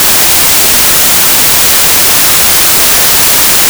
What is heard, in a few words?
noise